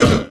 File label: Skouter damage